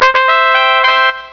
Fanfare; TreasureMath

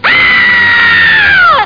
aaaahhhh!; music samples

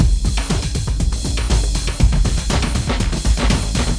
drum bass

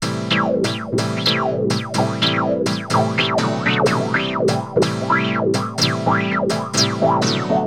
piano house 125 A; eJay; Keys

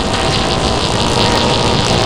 SCRUB

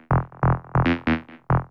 bass A; Techno; TechnoMusicMaker

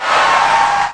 skid; trakatak

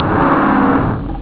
chord, guitar; OctaMED SoundStudio V1

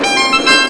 Flourish, Trumpet

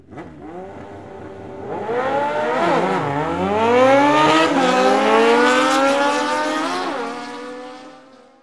motor race, cycles; KABOOM! - Wild and Wacky Sound Software; citylife

